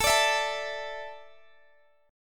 A Suspended 4th down Guitar Open G

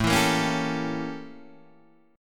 A 7th Flat 9th Guitar Open D

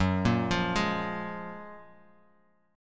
Gb Major 9th Gb, Guitar Open G; chordshape; down

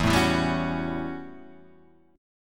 A 13th E, guitar chord

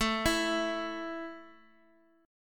A 5th A down Guitar; arpeggiated

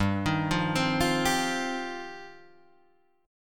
G 7th Suspended 4th Sharp 5th, Guitar Standard; G7sus4#5 arpeggiated

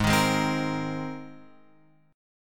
Ab Major Add 9th Ab, Guitar Open F; strummed; x,3,3,3,3,5

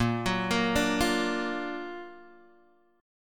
F sharp Augmented Major 7th A sharp, chord

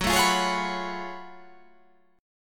F sharp Minor Major 11th

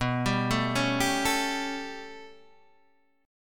B 7th Suspended 2nd Sharp 5th B, Guitar, Open D

